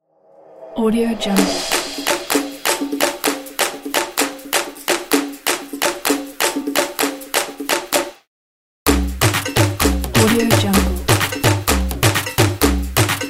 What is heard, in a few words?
tribal dance